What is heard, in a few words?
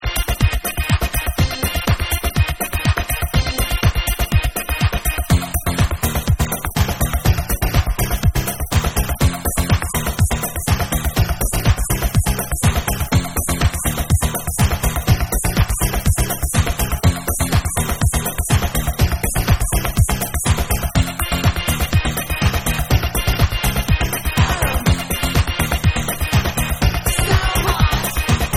Techno Club Mix